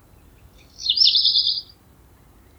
black redstart song, Sometimes sings the two notes randomly instead of alternating.; Black redstart (Kopciuszek); 1.5. Black redstart (Kopciuszek)